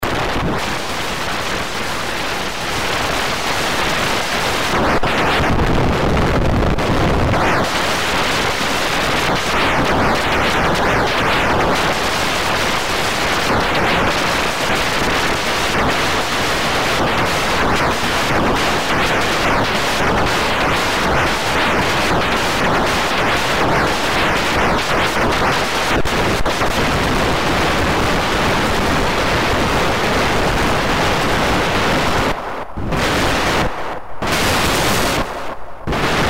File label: White Noise Whiplash